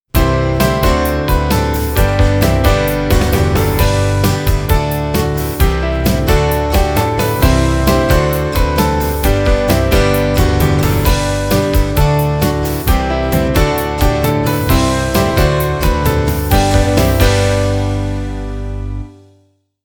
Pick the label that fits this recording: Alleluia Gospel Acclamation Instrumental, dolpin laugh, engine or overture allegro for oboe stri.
Alleluia Gospel Acclamation Instrumental